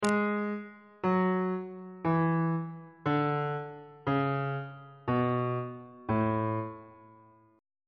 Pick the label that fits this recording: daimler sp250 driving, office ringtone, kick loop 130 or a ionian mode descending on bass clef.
a ionian mode descending on bass clef